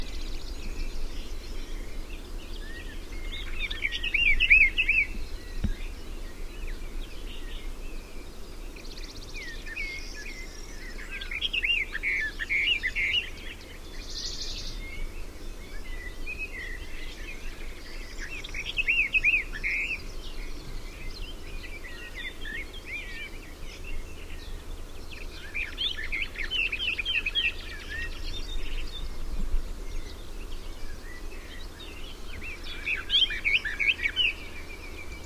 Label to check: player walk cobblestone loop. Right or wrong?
Wrong